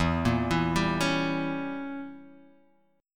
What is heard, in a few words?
E Diminished 7th E, Guitar Open G